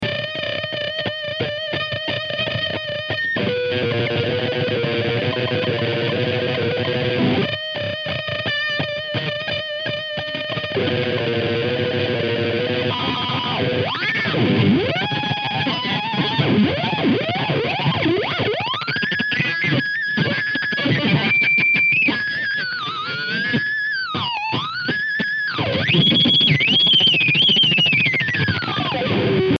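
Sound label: guitar solo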